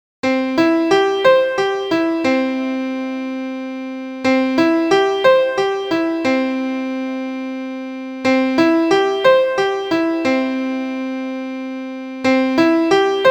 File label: broken chord